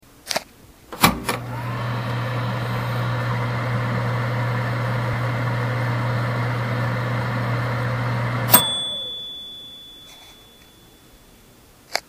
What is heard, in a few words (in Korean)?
위잉땡